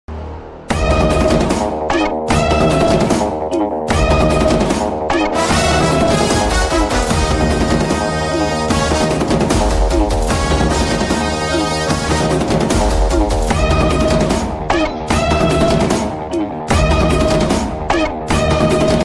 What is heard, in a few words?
trailer bgm ringtone; mark antony trailer